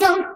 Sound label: Vocal Oneshots; Vengeance Samples; Vocals